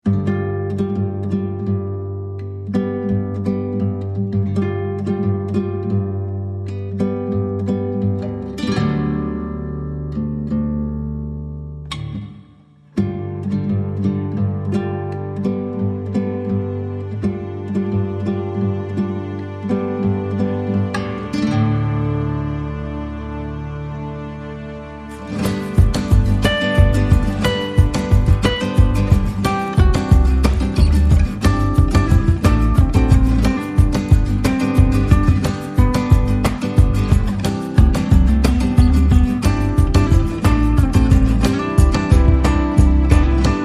Latin Lounge

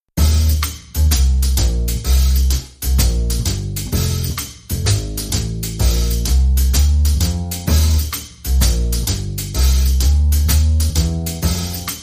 Drum & Bass

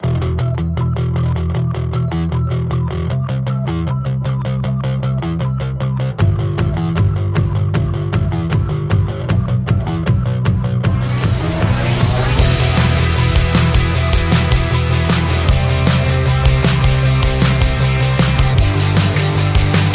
Guitar, Underscore

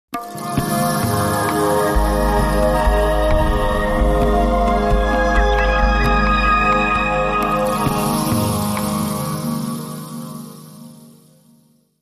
Ethnic Logo

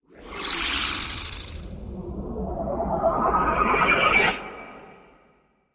Interstellar Travel

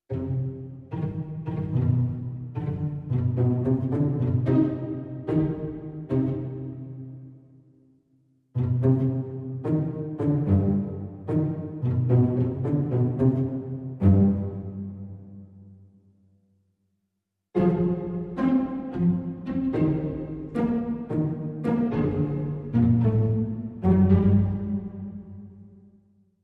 Tango, Strings Only

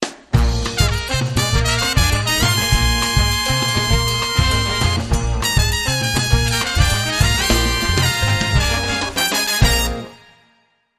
Latin Brass, Jingles

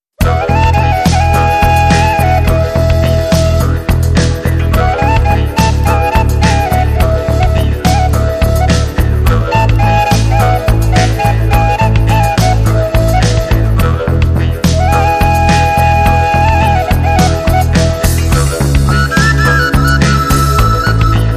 Lowland No Vocal SFX

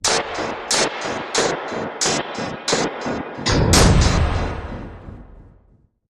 Metallic Stutter Impact

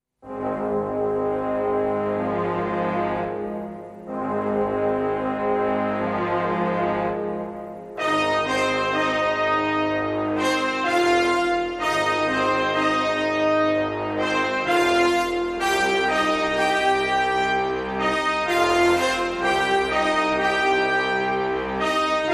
Brass Only

